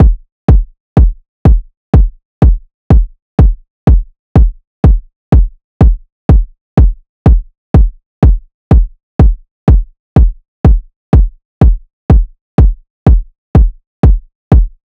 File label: Drum Loop - 124 BPM Kick; Loop Stems; Cymatics; Anubis Drum Loop 2